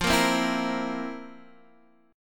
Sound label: F Major 7th Suspended 4th, Guitar, Half Step Down